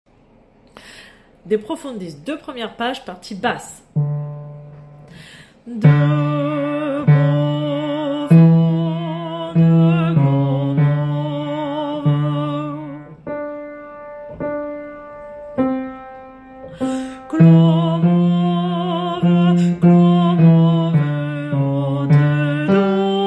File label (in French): basse; De profundis page 2 et 3